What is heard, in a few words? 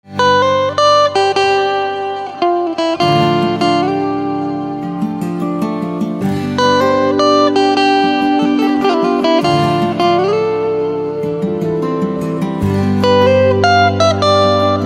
Guitar